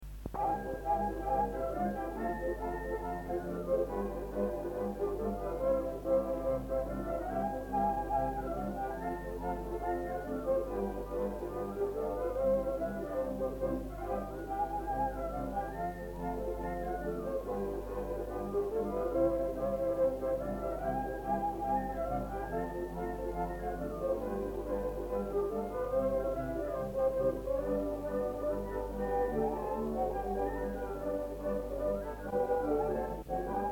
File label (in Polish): Instrumentalny